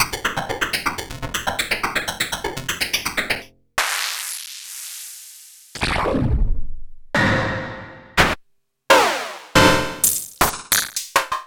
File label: Hat c